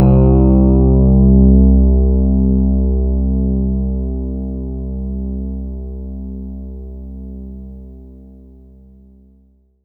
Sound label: C2, synth; 90 s Sample CDs; NiceBeats; SynthLine VOL-23